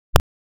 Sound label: 3 burst